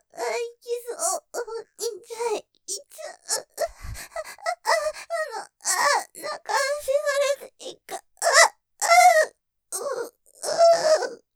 絶頂スパート いきそう、お兄ちゃんいきそう, ASMR; 中出しされていく; 立体; ステレオ; ループで楽しむ！シェフの気まぐれ抜き抜きコース

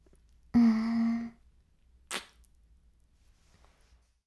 耳舐め音声(LR逆); 白くて黒い魔性円環

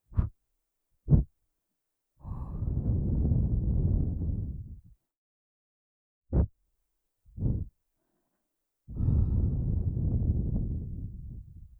耳かき, 吐息

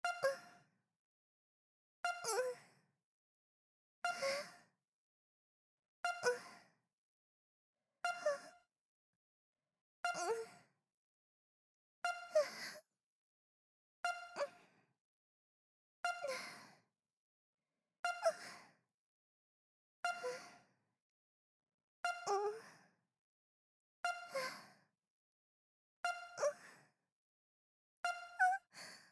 ゆっくり

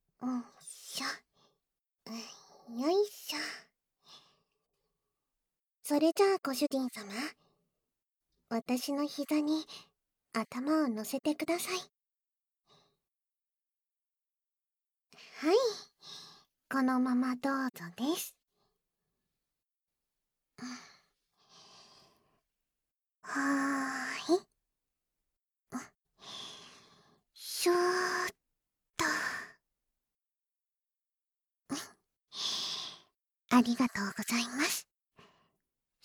耳くすぐりマッサージ（バイノーラルマイク 効果音なし）

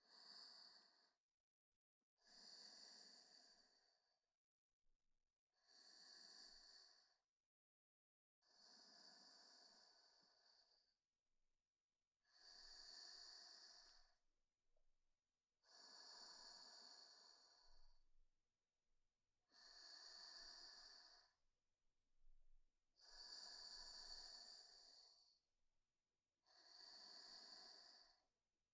寝息

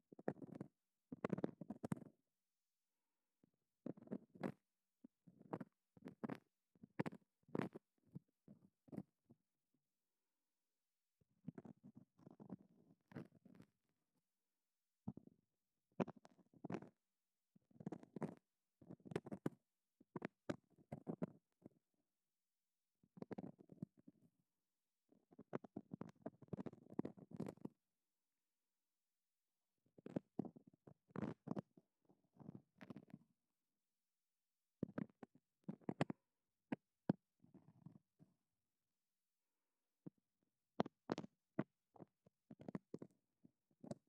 耳かき, ASMR

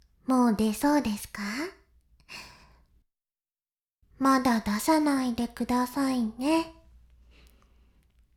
しこしこ音声, ASMR; 白くて黒い魔性円環

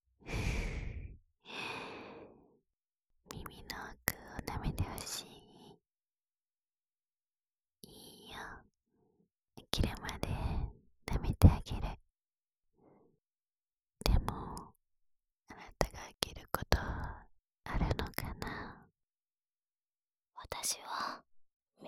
『深い耳舐め（反転）』, ASMR

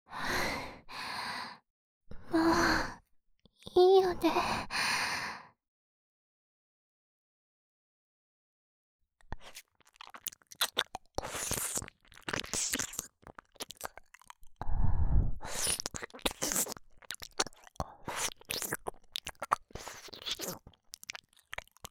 耳舐めのみ; 奴隷の取り合い

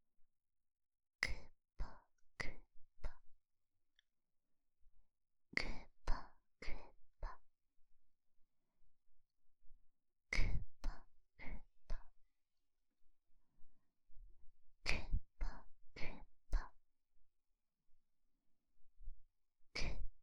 グポグポ; 左耳

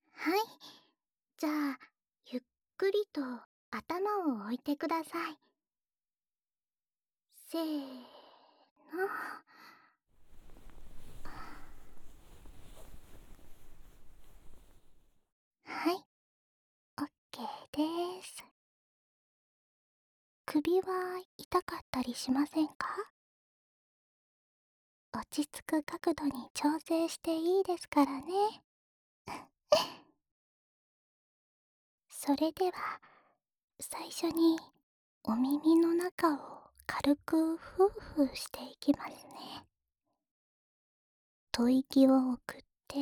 お耳のご奉仕、じーっくりとしてあげますねー。（右耳の耳かき), ASMR

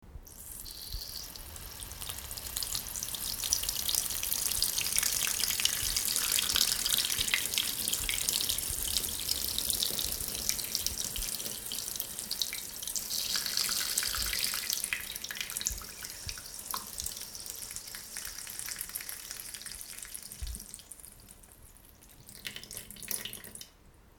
しょーー ばちゃちゃおしっこ

